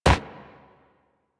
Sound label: Walk Dirt, Footsteps; Warframe; Excalibur